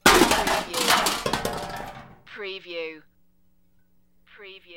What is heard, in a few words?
Metal Debris Impact Settle